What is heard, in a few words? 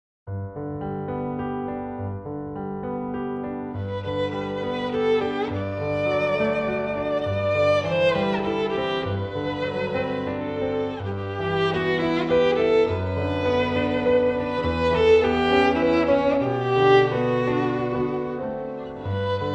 piano play violin